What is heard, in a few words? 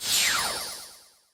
pacify; deltarune